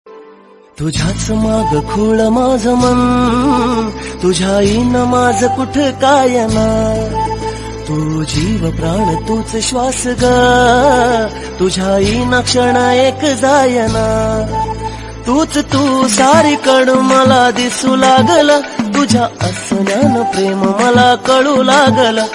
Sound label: Marathi Ringtone